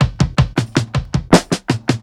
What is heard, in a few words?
tom linger; Drums; One Shots; Slate; AURORA Sample Pack; Toms